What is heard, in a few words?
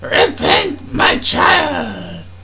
death; Alien Arena; drfreak